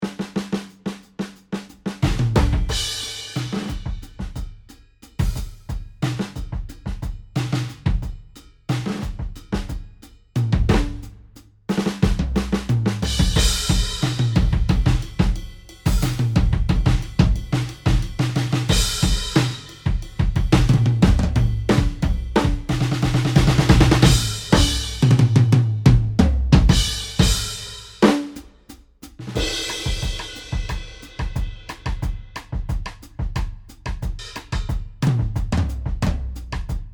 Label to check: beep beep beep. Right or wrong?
Wrong